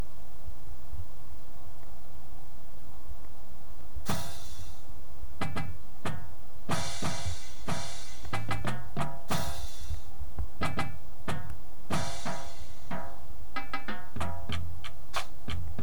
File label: full drumline